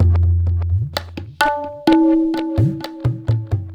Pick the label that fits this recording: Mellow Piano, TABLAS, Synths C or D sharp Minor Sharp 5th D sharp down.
TABLAS